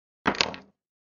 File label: Placing Object On Table Sound Effect